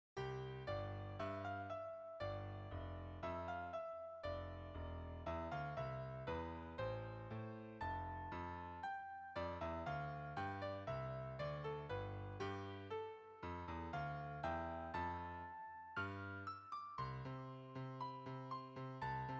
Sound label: Melody Bass